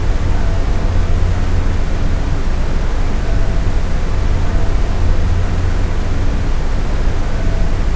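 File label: ambient air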